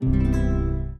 drinking coffee; Samsung Galaxy S10; AvatarEmojiSticker Beyond

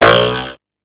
error

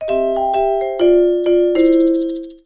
Alert

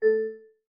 Class Harp, notifications

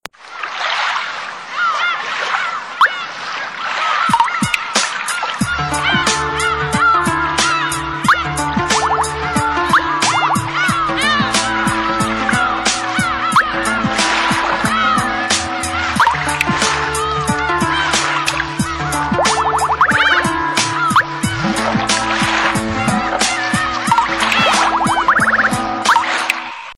Water mood, ringtones; Huawei M228

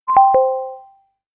Battery low; Nokia N79; Audio themes

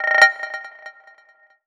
Brass alert; Sony Ericsson; PlusFourSix; SE Sound Project; Lars Edvall; Walkman Fam 4

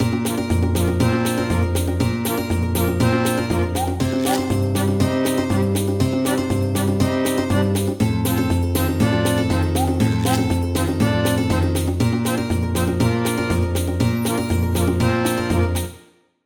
Game, ringtones

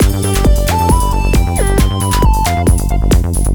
Crayon Rock, ringtones; Kyocera DuraForce Pro

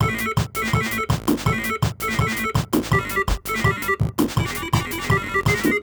alarm retro; Razer Phone; alarms

